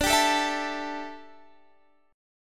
D Suspended 2nd Suspended 4th D down Guitar Standard; strummed